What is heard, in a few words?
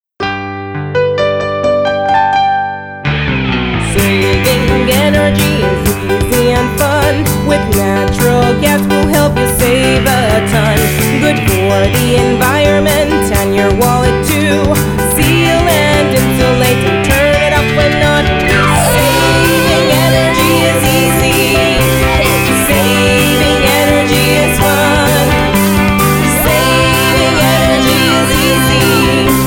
Electric Guitar